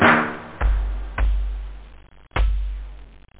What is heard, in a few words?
break; da capo vol1; drums snare